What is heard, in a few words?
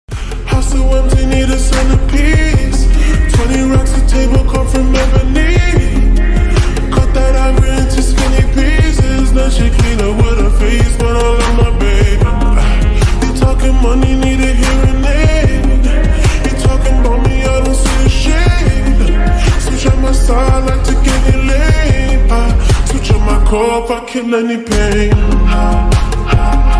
slowed reverb ringtone